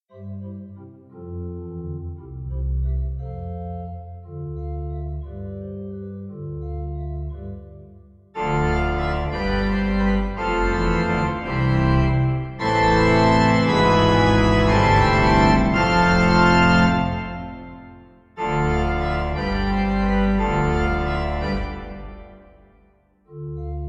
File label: Wet, organ